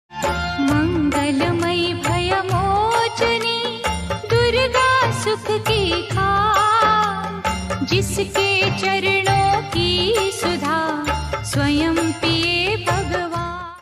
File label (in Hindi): भजन गत durga puja special song ringtone